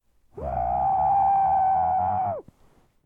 Muffled Scream

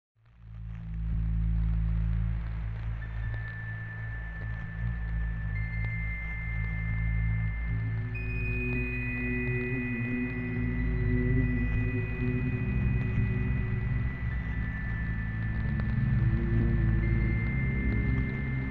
Scary Ambience

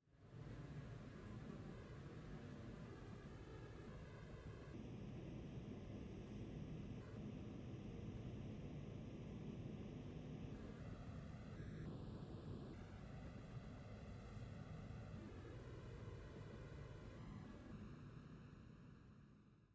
Generic Indoor Roomtone

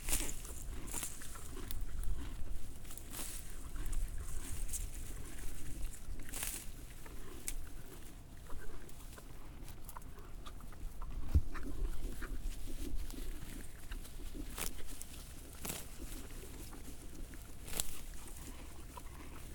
Grazing Horse

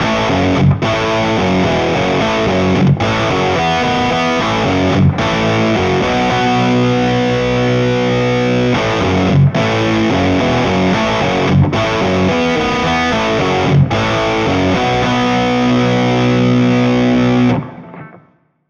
Guitar Riff Heavy

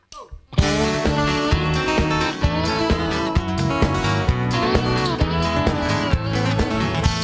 Intro Country

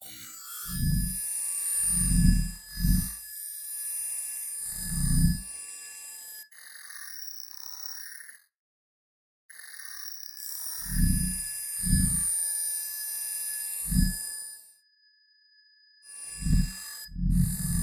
Matrix Style Morphing

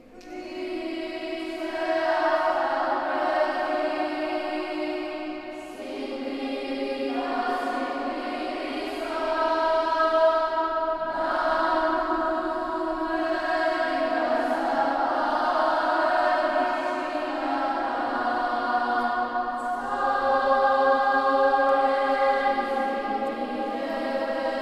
Sameba Kids Choir

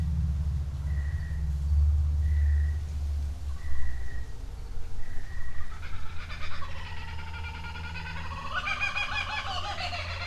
Garden Ambience; Grilla

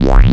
Womp

